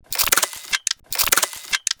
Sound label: Rhythmical Movement Tick Loop, Mechanical Morphs And Mutations